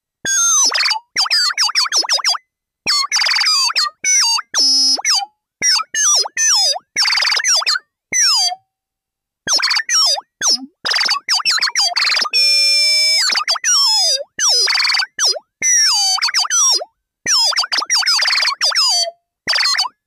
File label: Comedy Synth Cute Robot; robots